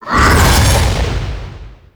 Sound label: Golem Melee Swing; Warframe